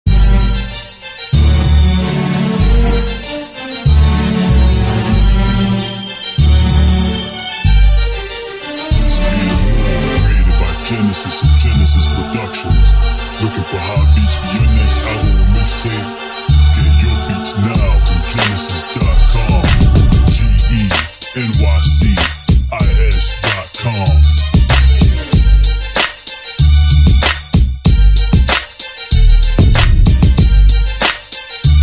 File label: Violinist Ambience